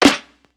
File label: Lo Fi Shifting Snare; Freddie